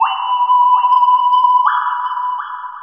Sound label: FLUTE, 168 BPM; Ueberschall; Drum & Bass Flash Trackz